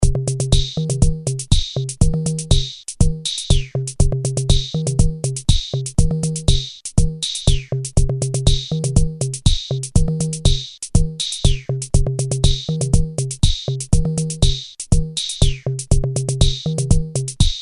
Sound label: Drum